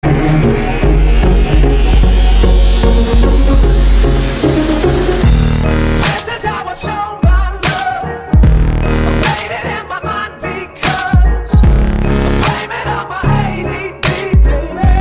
dubstep remix

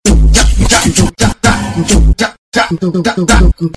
beat

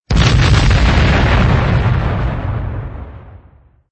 fighter explode; tie

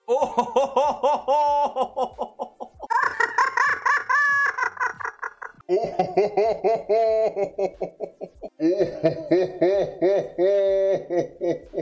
hohoho

